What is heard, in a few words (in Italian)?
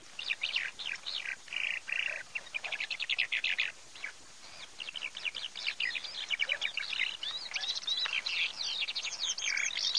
ALLODOLE